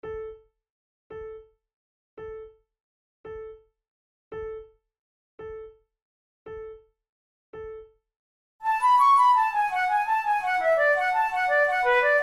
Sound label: in a major, flute